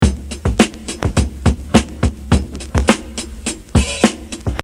Hihache Original Tempo q16ths

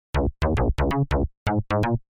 Wonky Bass Dry

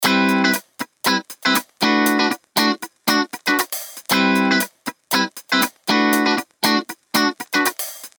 Live Hats master with guitar

